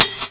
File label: pling; ActionCat